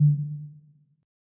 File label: quick Stop; berry burst